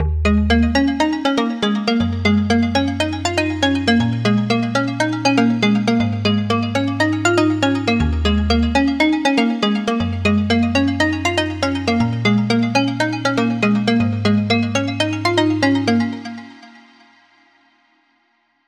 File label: Synth D# 120Bpm, House; Musical Loops; House Kit; Ghosthack; Advent Calendar